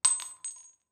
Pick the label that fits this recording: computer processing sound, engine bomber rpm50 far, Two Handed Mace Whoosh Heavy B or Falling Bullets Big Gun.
Falling Bullets Big Gun